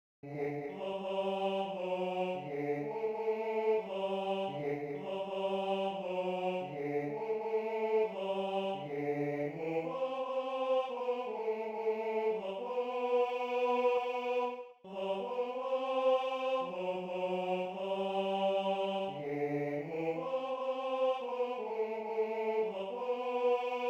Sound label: Tenor II